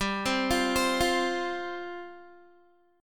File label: G 7th Suspended 4th G down Guitar; Listen to G7sus4 arpeggiated; G7sus4 Chord